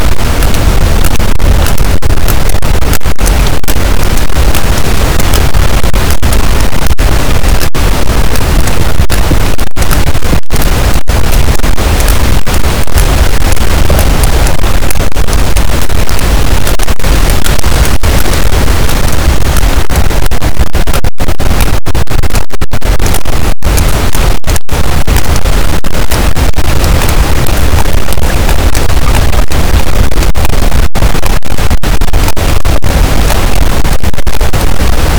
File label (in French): cachalot positif, boat, Physeter Macrocephalus